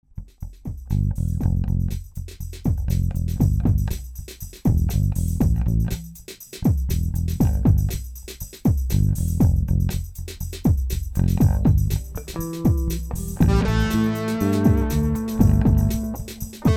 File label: Jam, Guitar, Bass; Jamjar